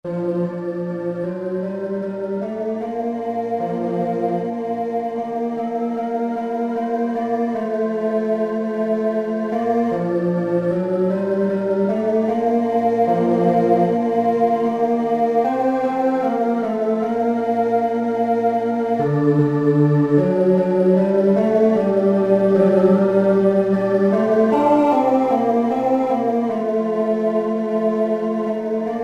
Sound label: TENOR